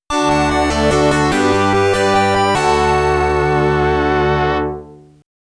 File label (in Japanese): ファンファーレ